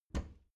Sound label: bathroom cabinet doors open soft; objekte; tueren